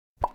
pop

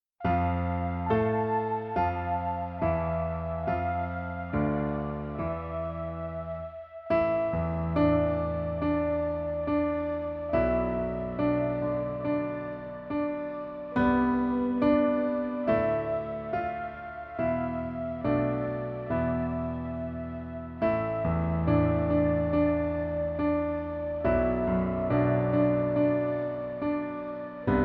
instrumental; drv3

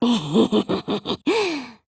ehehehe; ibuki

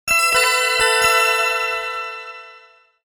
magic band

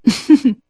laugh

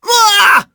falling; sonic; vc

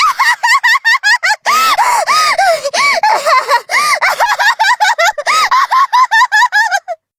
laugh

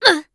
defend hit; tressa

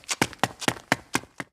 run

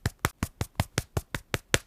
makeup

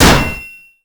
damage; sonic

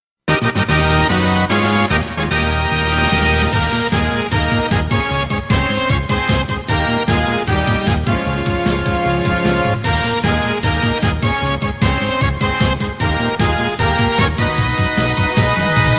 victory

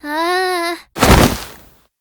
collapse; tressa

